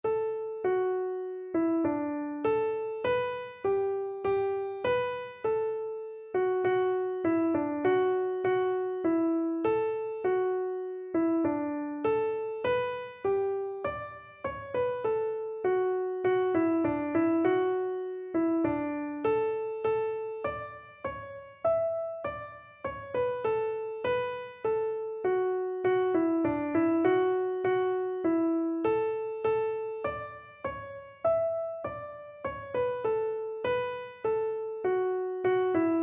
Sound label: he leadeth me, keyboard